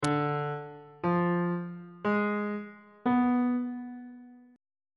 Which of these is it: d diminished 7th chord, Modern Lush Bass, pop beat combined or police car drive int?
d diminished 7th chord